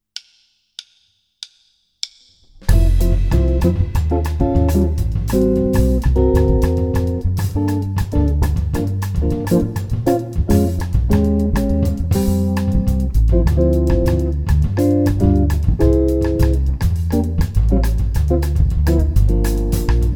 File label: Samba; Jazz Harmonia